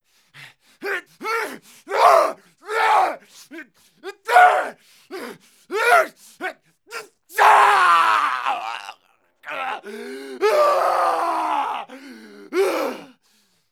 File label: fighting yelling male; Sony Pictures Sound Effects Series; Vocals & Wallas